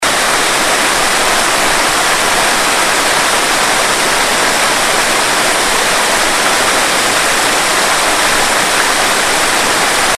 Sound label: Buttermilk Falls; Norfolk